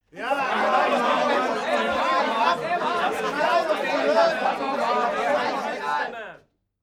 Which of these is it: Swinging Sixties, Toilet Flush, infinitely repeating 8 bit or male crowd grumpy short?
male crowd grumpy short